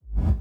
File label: BD Gate FX, Bassdrums; Vengeance Samples